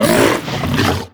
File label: pull grunt, barnacle creatures; Half Life Alyx